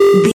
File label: retro alarm siren loop